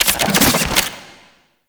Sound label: Fist Double Spin; Enemies; Warframe; Tyl Regor; Fists